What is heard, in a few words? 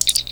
taps